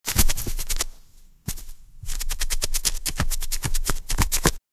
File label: sound of bamboo sticks